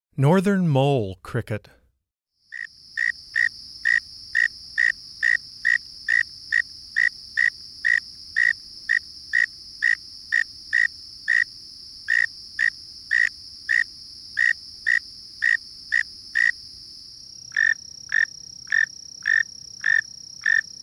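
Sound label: Northern Mole Cricket, Wildlife Sounds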